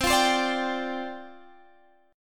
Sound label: C Suspended 2nd Suspended 4th, down, Guitar, Half Step Down